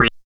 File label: WA NOTE, Phat & Phunky; East West - Phat & Phunky CD2; 90's Sample CDs